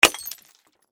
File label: Impact Glass Smash, Hard; Smashes; Objects; BlastWave